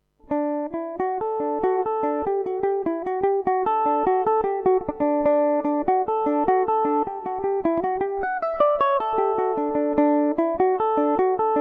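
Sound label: D major revelation, Irish mandolin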